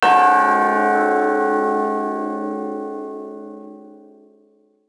bell; World of Tanks Blitz

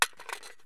ak74 mag out tactical switch; Escape from Tarkov

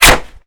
bullet canvas, impact; weapons; Modern Warfare

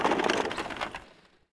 Chariot End; Civ4 warlords

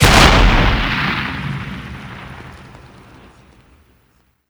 turret cannon explosion

